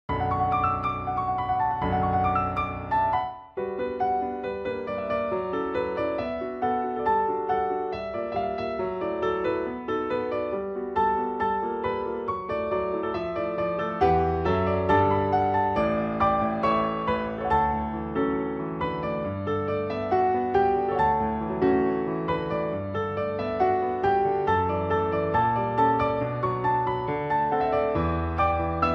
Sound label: piano ver.